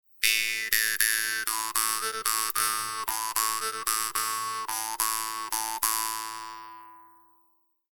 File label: doromb; rococo